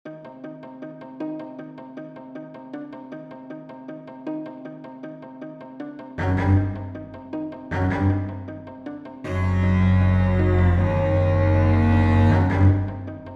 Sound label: orchestral